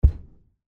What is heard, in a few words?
footstep